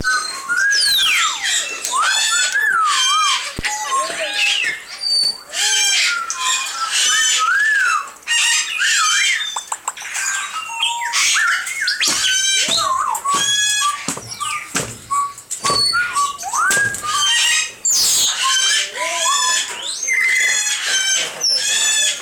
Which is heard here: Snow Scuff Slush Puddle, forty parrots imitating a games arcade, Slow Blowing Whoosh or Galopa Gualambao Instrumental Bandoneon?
forty parrots imitating a games arcade